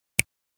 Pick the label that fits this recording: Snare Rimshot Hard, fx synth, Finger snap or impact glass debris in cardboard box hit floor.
Finger snap